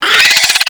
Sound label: Infested Biped Attack; Warframe